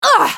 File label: spell cast effort, en US; Sivir